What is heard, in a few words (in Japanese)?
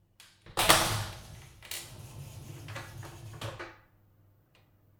風呂ドア開く音